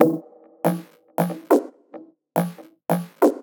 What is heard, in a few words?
Top Loop 140 BPM, psytrance; Loops